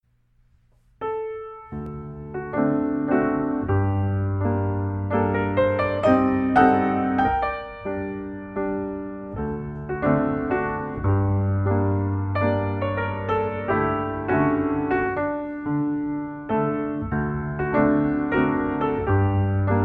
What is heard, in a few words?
Mazurka in g minor